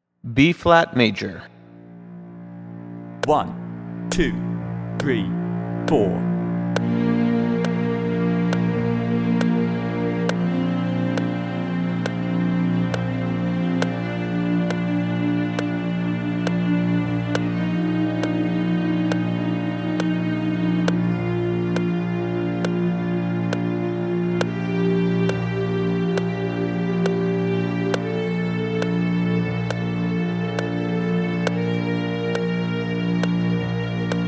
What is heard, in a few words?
Bb Major Scale with Drone; Automate and Motivate 21st Century String Players with Technology